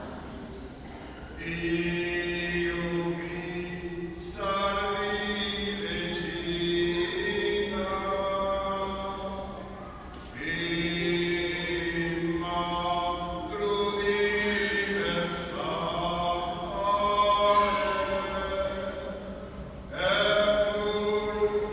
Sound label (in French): Chant à la Vierge corse